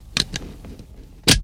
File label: Seat Belt Click On